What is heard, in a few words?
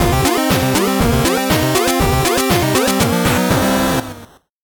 8bit jingle slow